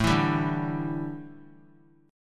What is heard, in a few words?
A 7th Suspended 4th, A, down; A7sus4 strummed